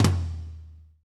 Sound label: tom, Pop Country; Northstar; Drumscapes Roland